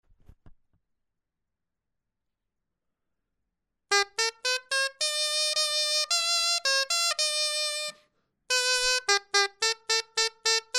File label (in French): clarin etude